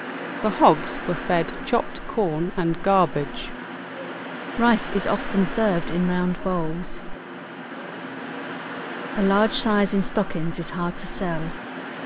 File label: Air conditioner original conditions